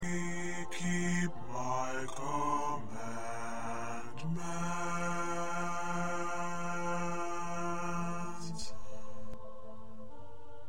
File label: Bass